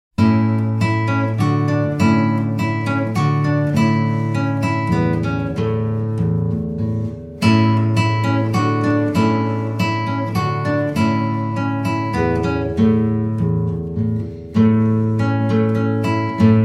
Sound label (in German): Kommet ihr Hirten Tempo 100